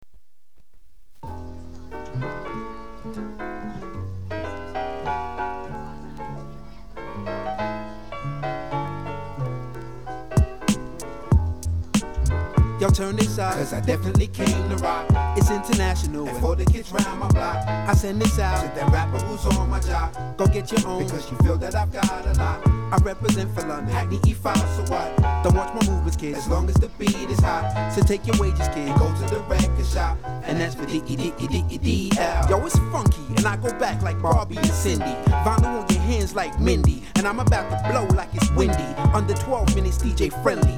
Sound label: funky